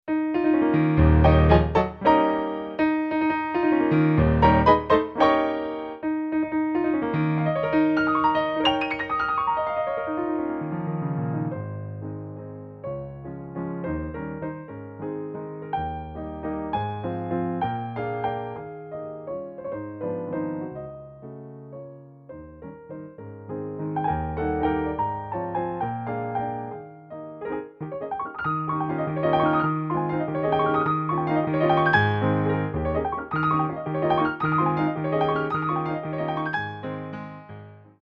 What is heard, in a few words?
CLP470 Concert Grand; Thomann Demo